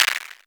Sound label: Maracas